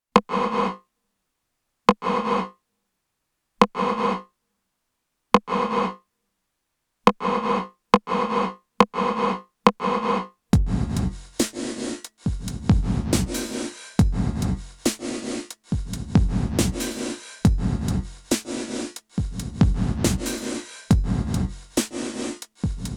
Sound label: Sony DRE 2000 TR909 Sony MU R201 Reverse